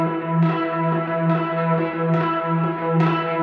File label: Cigar Flange FX 140 E, guitar drone